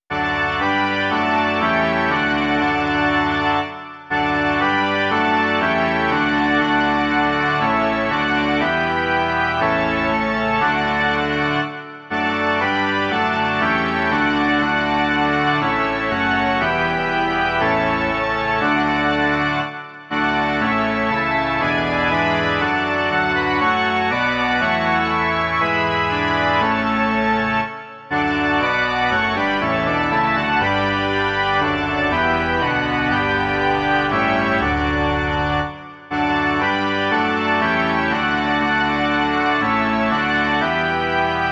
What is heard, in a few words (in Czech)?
varhany; Rok starý v moře věkův